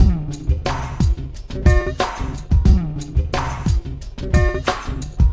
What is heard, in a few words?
090 stretchy funk; SAMPLED MUSIC LOOPS